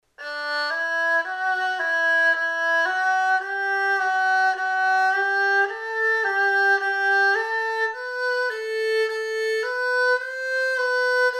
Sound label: erhu